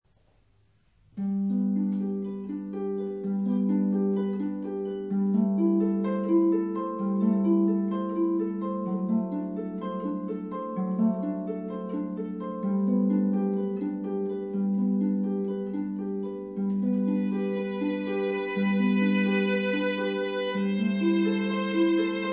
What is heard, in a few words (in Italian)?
Arpa Viola